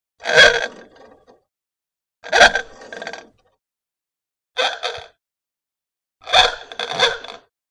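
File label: Horn Model T Ford Klaxon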